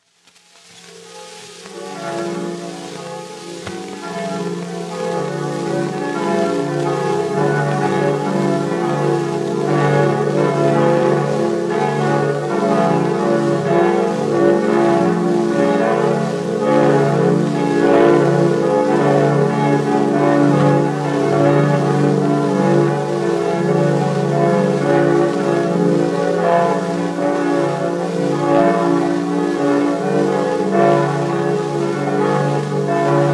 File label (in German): die glocken unserer kirche